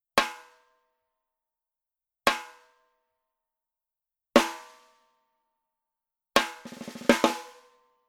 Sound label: SNARE Etched Rim Shot